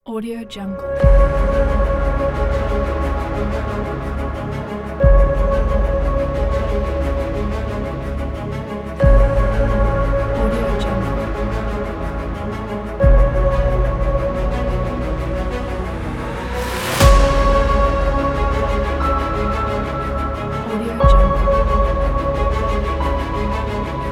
cinematic trailer; silenceart

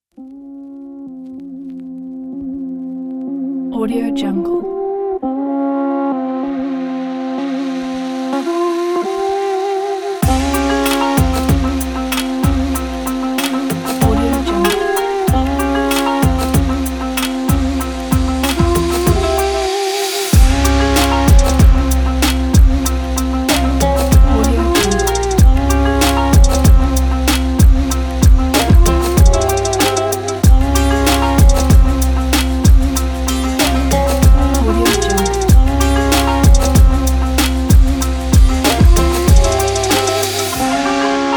arabic; raspberrymusic

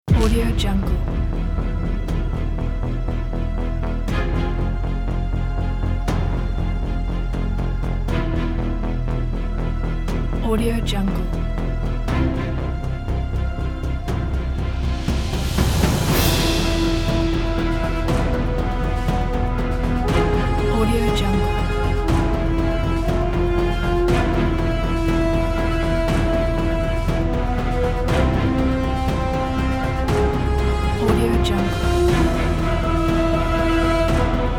action tension background

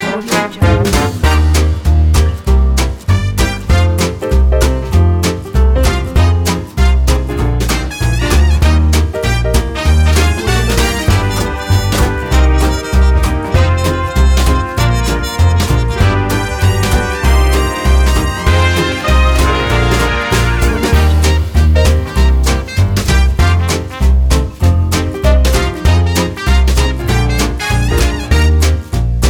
brass swing

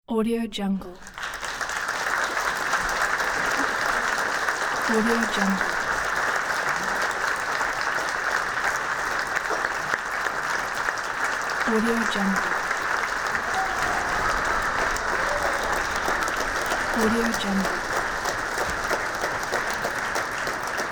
applause

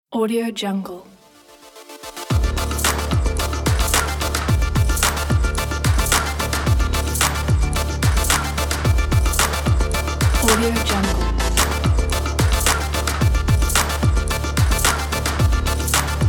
stylish hip hop opener logo pack

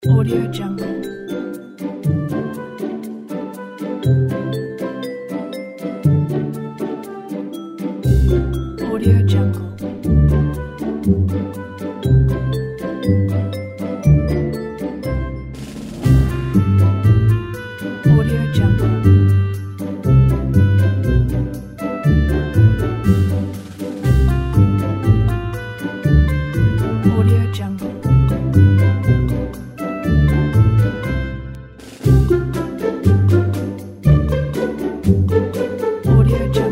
magical summer adventures

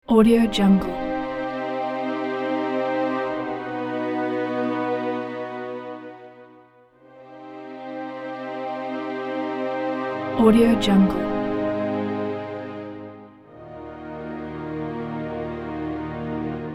fantasy chords ident

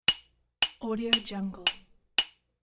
hammer striking chisel loop; electronplanet

